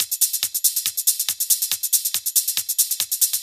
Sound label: Hh Loop, Drum Loops 140bpm; Zero-G Trance Inducer